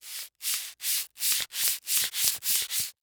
Industry Tool Sandpaper Sand

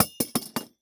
Household Metal Meat Tenderizer Drop On Wood

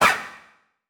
Flash Animation Short

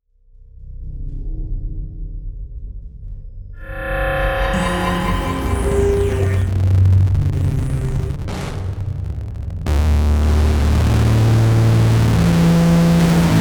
Distortion Static Random Blips; Module Production Element Title Imaging

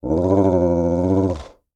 Animal Mammal Carnivore Dog Doberman Growl; animals; AdobeCC

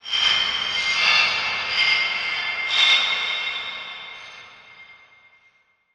Transition Metallic Warble; Production Element

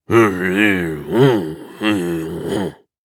Human Male Grunt Mumble Grunt

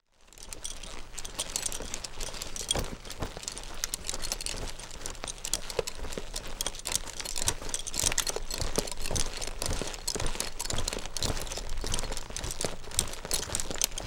Animal Horse Saddle Move Long; animals; AdobeCC

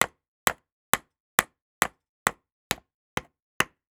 Industry Tool Hammer Hitting Nail Into Wood

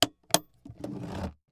Household Briefcase Lock And Pick Up

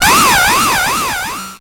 Title Transition Delay Short

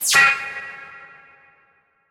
Transition Laser Horn; Adobe; Production Element

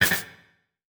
Transition Hit Impact Short; Production Element

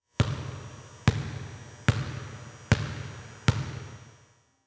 Sports Basketball Dribble With Lights On

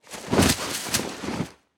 Impact Body Roll; Impacts

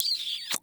Cartoon Human Female Kiss Squeaky Kiss

